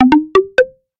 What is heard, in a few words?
Bounce, notifications; Coolpad; Note-8